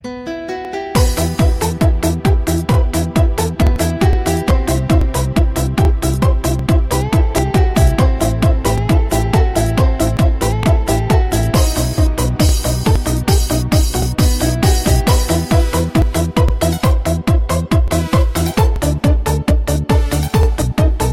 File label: techno